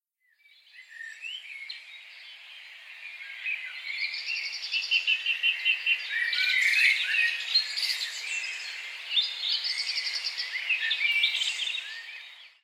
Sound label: Birds in the garden; BIRDS & MUSIC